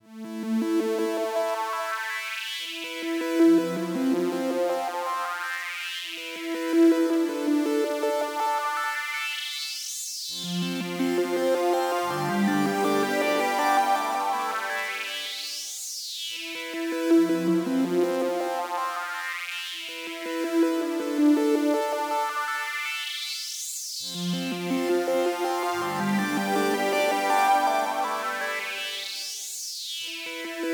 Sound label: SYNTH